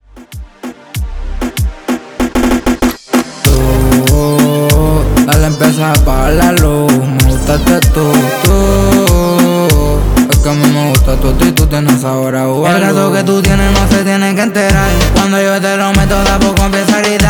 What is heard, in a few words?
Uuuuu Intro Verso 96 Bpm